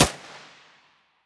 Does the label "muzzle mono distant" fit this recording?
Yes